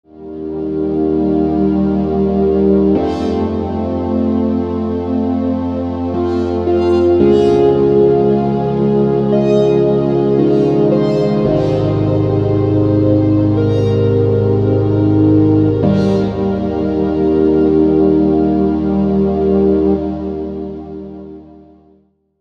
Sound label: Orchestra; Siel Orchestra